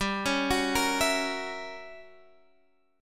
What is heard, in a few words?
F sharp 7th G, chord; F#7/G arpeggiated